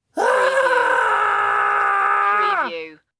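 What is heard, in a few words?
Man Scream Ah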